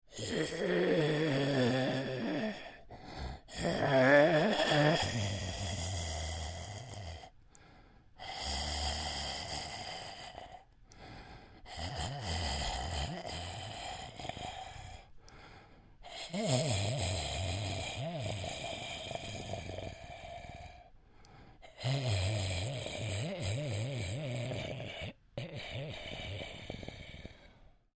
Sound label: Creature Troll Exhales Wheezy; Monsters; Horror; BigRoomSound